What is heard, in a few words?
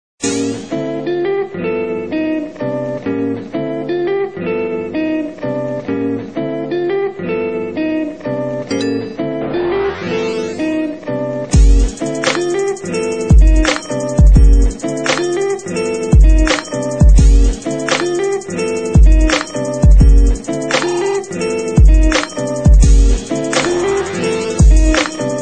Jazzy